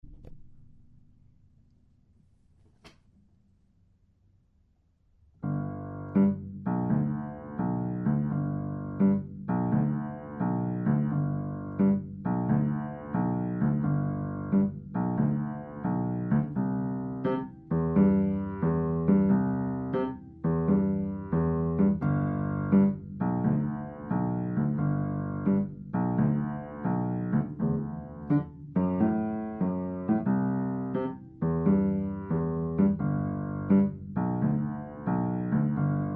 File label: Bouncy Bass